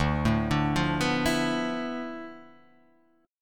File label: D Minor 6th Add 9th